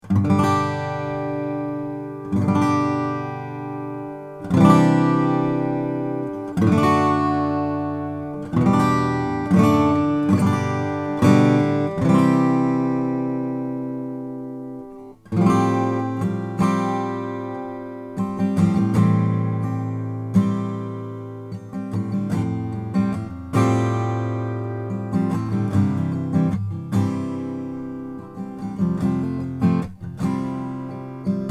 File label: morgan om koa